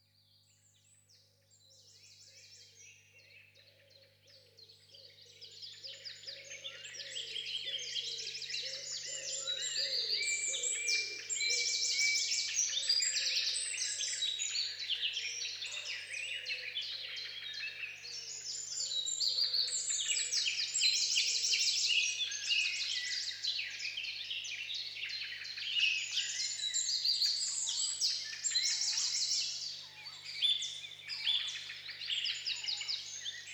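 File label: concert early in the morning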